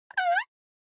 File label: Poo Poo Cushion